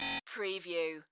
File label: Beep Modulated Single; sound-effects-bible